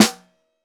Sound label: SN1 Bottom, snare